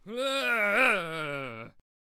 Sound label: Brawler Death Vocals